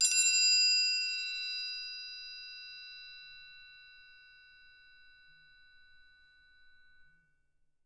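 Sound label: SM TRI right, percussion; Miroslav Vitous - Percussion & Harp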